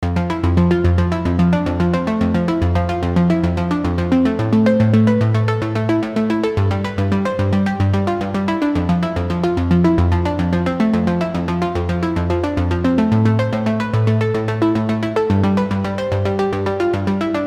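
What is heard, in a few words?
110 A minor Synth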